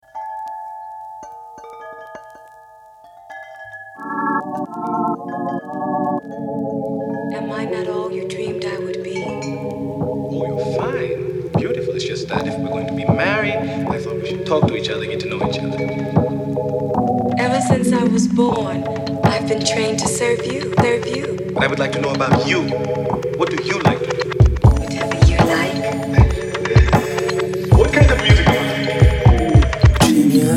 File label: Waves